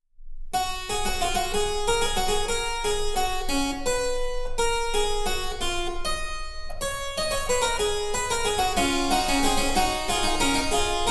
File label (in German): Cembalo; Mark Lindley WTC 1